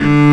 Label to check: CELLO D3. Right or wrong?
Right